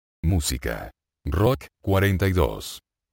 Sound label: rock